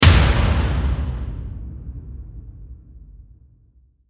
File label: Hit Epic; Ninja Tracks